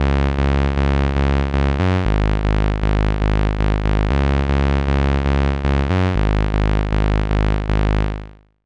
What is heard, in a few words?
System 100 Soft Sawtooth Bassline